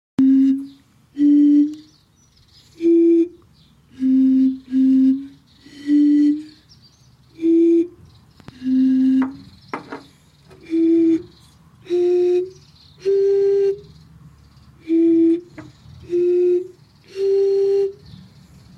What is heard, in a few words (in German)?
Flaschenmusik